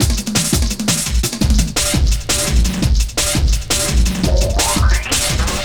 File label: Swift Beat, Drum & Bass, Beats & Breaks; Fluid Dynamics